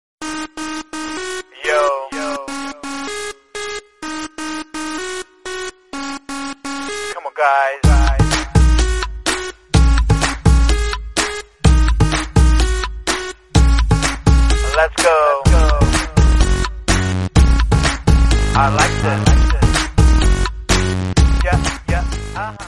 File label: telugu powerful tone; ringtone